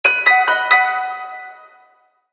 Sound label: Msg Animato; Vertu Constellation Quest